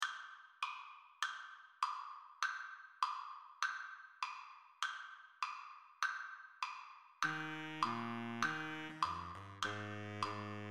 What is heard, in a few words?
Baritone Saxophone Slower Tempo; Bari Sax Only - Slower Tempo